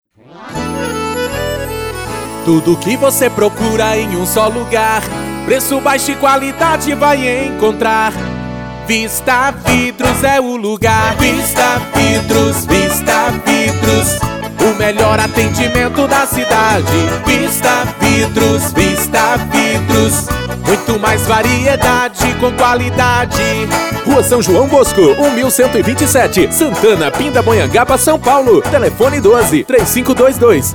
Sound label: Jingle; Vista vidros